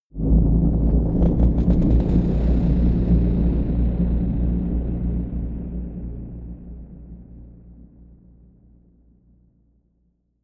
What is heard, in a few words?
whoosh tonal rumble rise